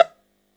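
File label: EL BZ PIZZ E, bouzouki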